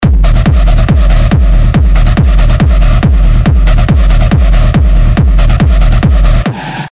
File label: Screech; Screech Stomper; Revealed Serum 2 Big Room Techno Drops Vol. 1